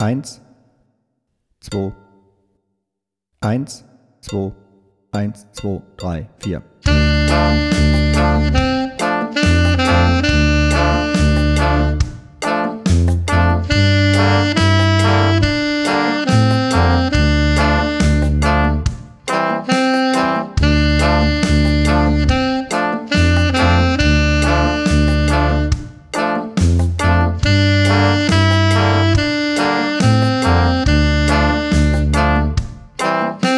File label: Sax Solo